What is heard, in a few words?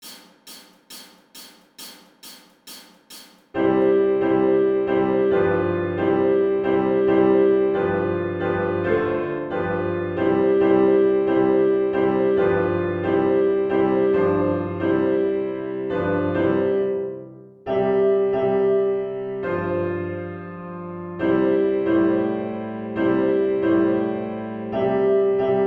melody